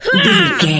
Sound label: voice female attack